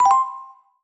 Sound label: Notified; HintsStarsLite